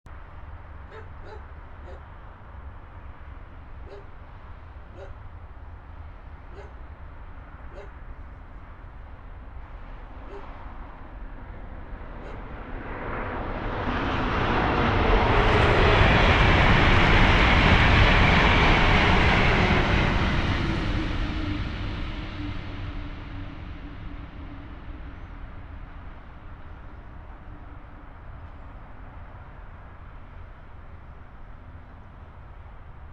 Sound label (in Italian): Treno; Eigenmike; ComunePR; NuovaStradaF